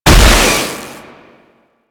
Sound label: Purification Weakpoint Destroy; Warframe; Nineteeen Ninety Nine; Infested Survival